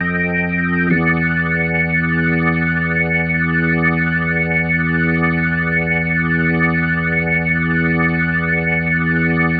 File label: Ending, Organ; Sonic Foundry (Sony Creative Software); Joe Vitale Organ Donor; Endings